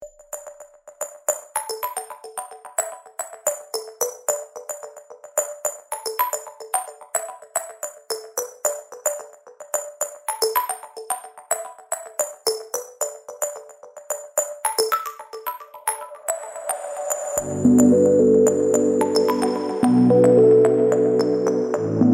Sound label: Ringtone; Redmi Phone